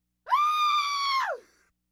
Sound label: Laugh tracks